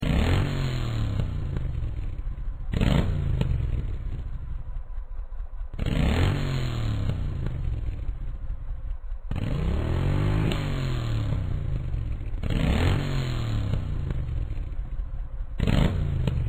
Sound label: Triumph Thunderbird